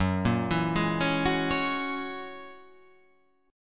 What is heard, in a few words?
F sharp 9th F sharp, chord